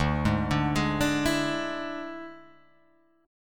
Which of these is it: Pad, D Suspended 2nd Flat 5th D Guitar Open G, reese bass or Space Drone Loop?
D Suspended 2nd Flat 5th D Guitar Open G